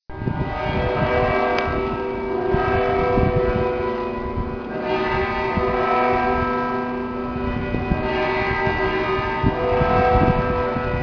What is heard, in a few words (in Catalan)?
campana